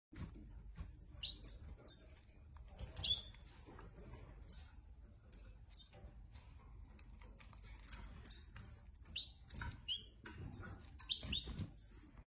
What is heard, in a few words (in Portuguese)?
canto do peito de fogo de bico azul